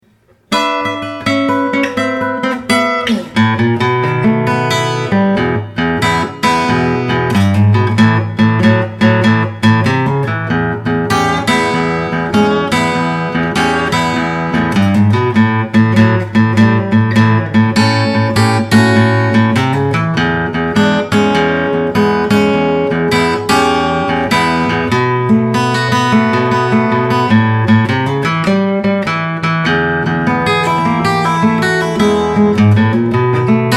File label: Delta Blues